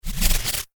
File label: Alien Death Spin; Conflict Earth - Alien Death Spin; Alien Sound Effects